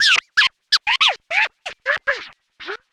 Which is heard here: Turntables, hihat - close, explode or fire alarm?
Turntables